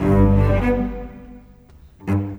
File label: Bass Cello